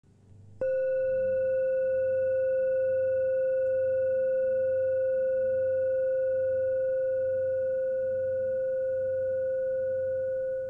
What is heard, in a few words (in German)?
Wurzelchakra Doppeldorje 528Hz